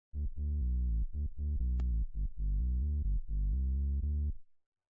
Rheem Mellow, organ

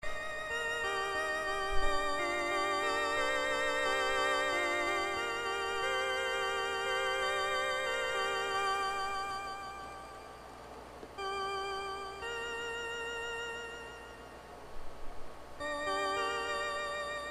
Gibson G101 long sust; Flute 8', String 8', Nazard 2-2/3'; Vibrato ON; LIGHT; SLOW

